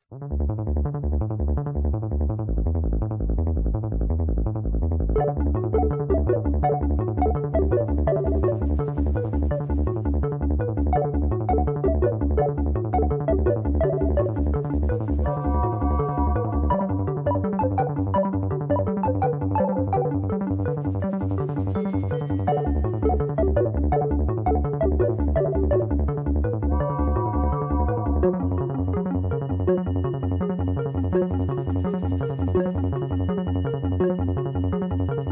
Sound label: electro train